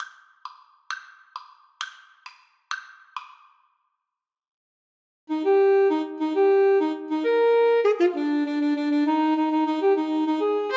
ASAX duet; Alto Saxophone Duet

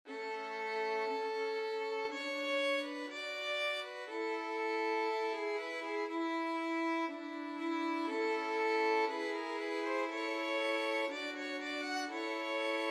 romance violin trio

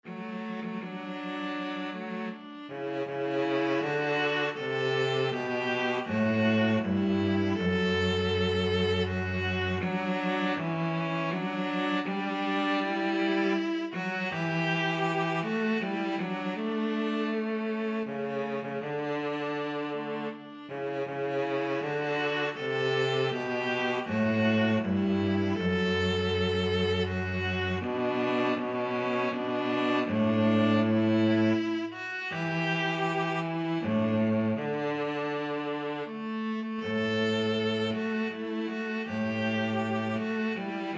nocturne, viola cello duet; mendelssohn

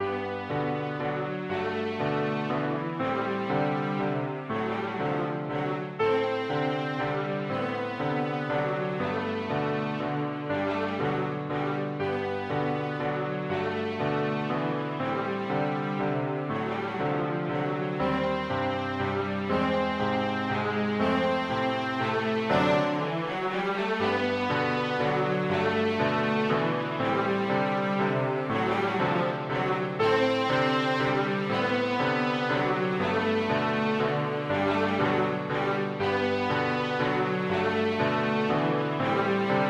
tchaikovsky flower waltz, cello

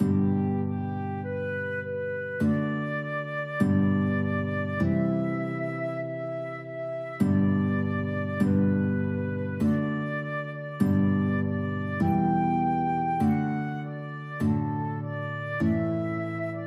flute guitar duet

